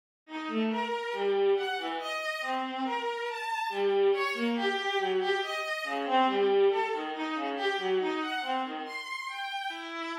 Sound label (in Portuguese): Melodia Trio